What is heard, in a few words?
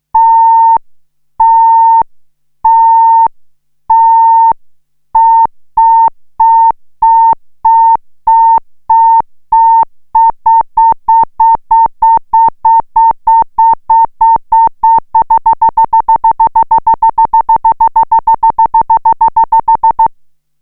Self-Osc VCF C